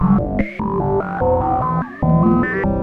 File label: C pulse